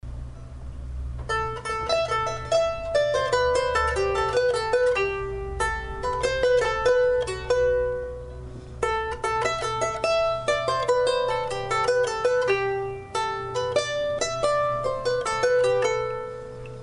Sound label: harp trotto only; interview; Janet's English Show